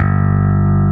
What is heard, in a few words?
bass